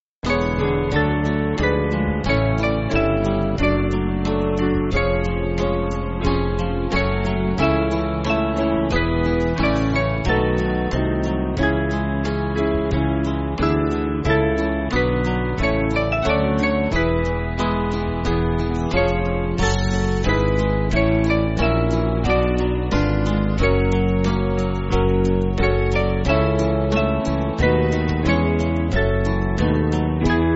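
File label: Band, Music Backing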